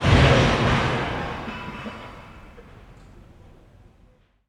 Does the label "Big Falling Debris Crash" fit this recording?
Yes